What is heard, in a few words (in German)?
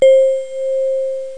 glocken; SOS-WIN SPIELE; knobel; n cross